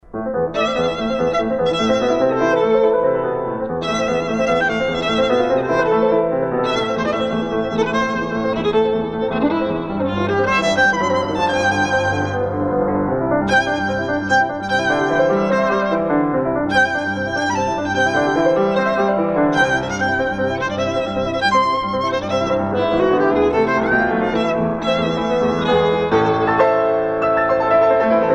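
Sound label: violin sonata; rubinstein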